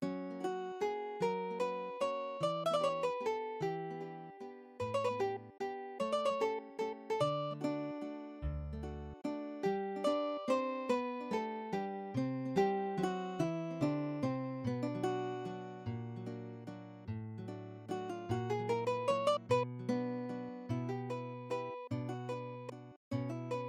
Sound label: MIDI BGM; Carmen Sandiego Junior Detective Edition; Europe3